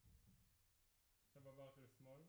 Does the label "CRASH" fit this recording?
No